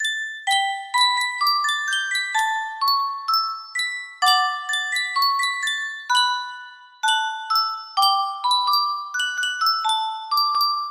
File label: yunsheng custom tune music box